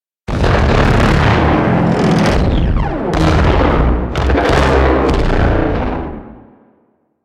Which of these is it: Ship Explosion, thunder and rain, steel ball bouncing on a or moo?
Ship Explosion